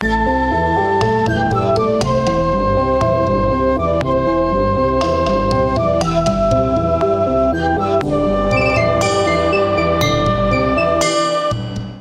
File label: Sting; MIUI14 and HyperOS 1.0